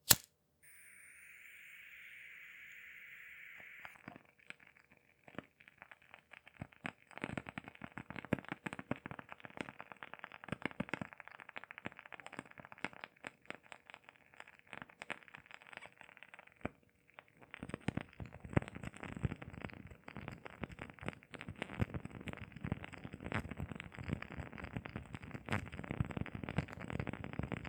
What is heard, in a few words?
Candle Oil Lamps Lighting